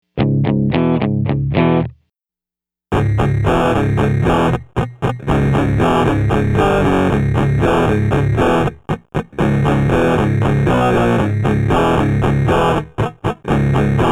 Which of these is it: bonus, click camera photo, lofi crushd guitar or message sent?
lofi crushd guitar